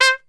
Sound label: C 4 PIC SHT, Brass; Best Service; Brass Super Section